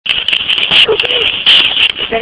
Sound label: whoopee